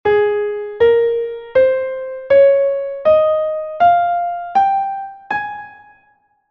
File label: scale of a flat; Essentials of Music Theory: Elementary